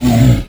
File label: minotaur hit; Crypt of the NecroDancer